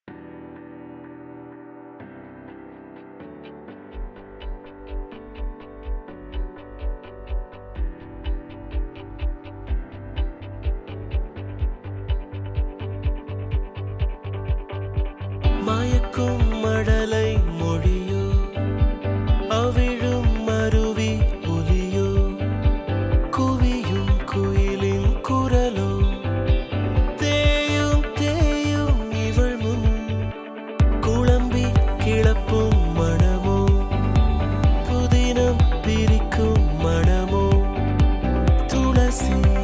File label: guitar; Navarasa